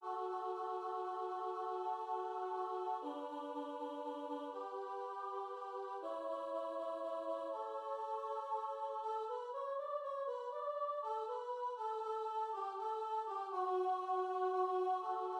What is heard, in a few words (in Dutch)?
bas